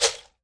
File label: shaker; Bunch of Shakers